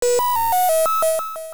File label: Insert Coin; Darius Plus; Darius Alpha; SuperGrafx